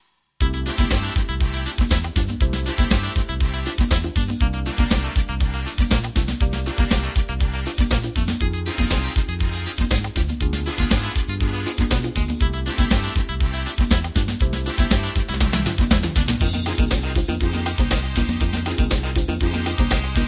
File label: DIsco Jazz